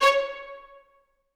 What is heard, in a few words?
C4, Orchestra; AKAI Advance Orchestra Upgrade 97 Vol.1